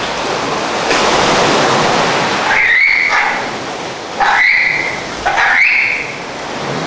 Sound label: adult chirp, aerial vocalizations by monachus monachus; Greece